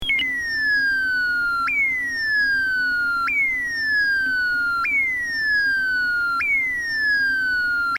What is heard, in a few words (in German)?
Eriston 150 Ton